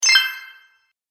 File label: Magic Purchase Ding; Cartoon Sound Design